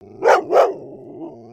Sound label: doberman bark; creatures pets